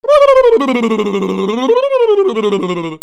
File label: CARTOON